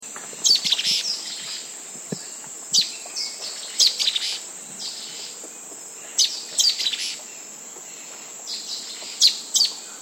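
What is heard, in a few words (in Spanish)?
Benteveo Mediano, Myiozetetes similis, Vermilion-crowned Flycatcher; cantos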